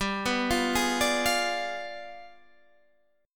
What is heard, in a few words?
G 7th Flat 9th, Guitar, Standard; arpeggiated; JGuitar